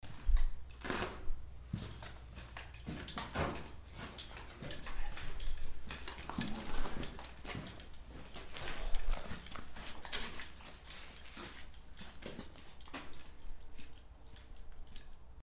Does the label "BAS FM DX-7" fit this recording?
No